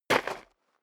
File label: step sneaker gravel light single left; schritte